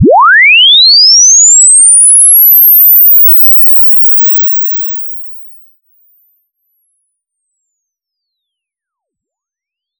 sine sweep linear; Tones

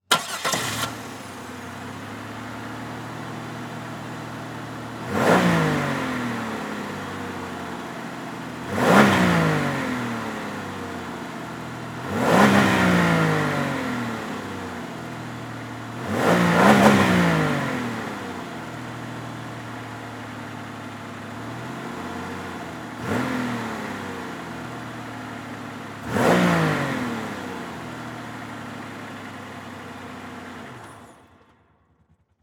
Transportation Car Monte Carlo Start Idle Rev Off

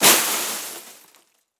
Crash Glass Debris Slamming On Metal; crashes; AdobeCC

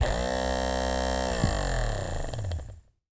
Technology Electronic Servo Motor

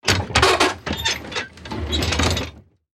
Industry Gate Metal Gate Squeak

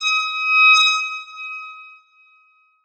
Title Transition Crystal Glow

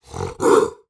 Animal Mammal Carnivore Cat Wildcat Hiss; animals; AdobeCC

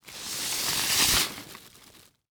Sports Stake Wood Stake Sliding Out Of Tent

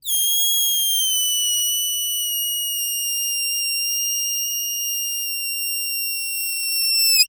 Cartoon Balloon Squeal; AdobeCC

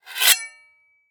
Weapon Broad Sword Slide Off Sword; AdobeCC

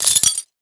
Impact Glass Drop On Cement Short

